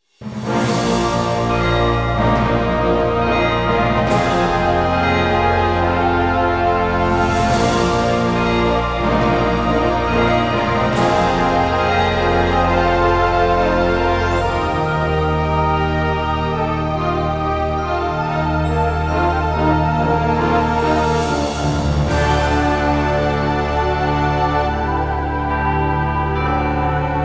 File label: The Chime of Blessing Rings Loudly; dot hack g.u. game music ost 2